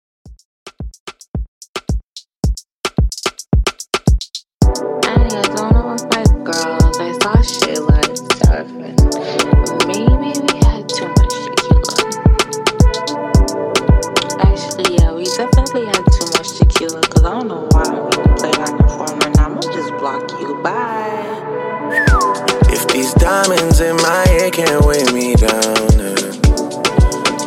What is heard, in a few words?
Afrobeat 110 Bpm